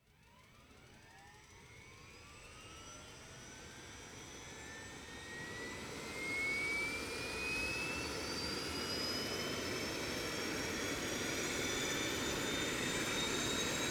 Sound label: Helicopter Close Start Up, A helicopter engine starts; A helicopter engine starts, rotors gather speed, torque and lift off.; Helicopter Sound Effects